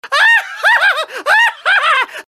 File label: nutty laugh; hl2dm; oppressive territory